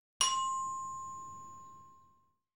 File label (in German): glockenspiel (c-dur-tonleiter) pro ton ca. 3 sec.; geraeusche digital